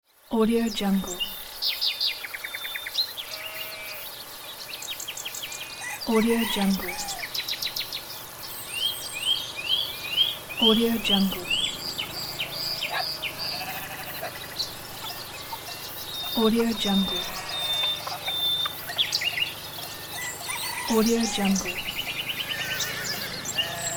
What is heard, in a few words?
village ambience with animals